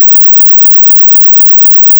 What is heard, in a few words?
tweeter blower aliasing